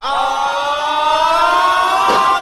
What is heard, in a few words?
ah group of men LONG; Voice; ooh ahh